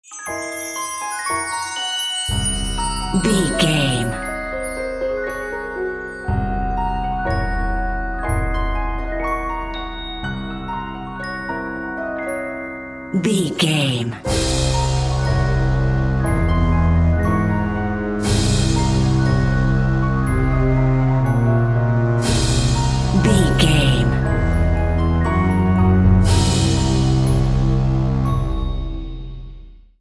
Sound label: trapped dream